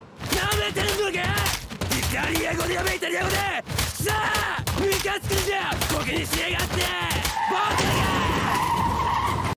rage; ghiaccio

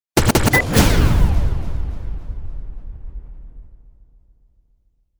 beep n whack

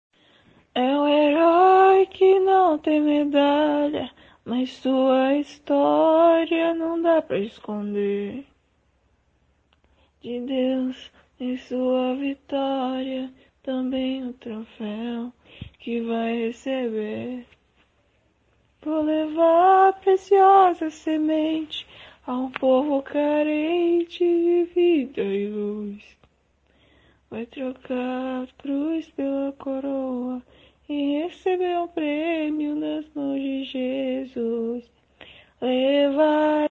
gospel

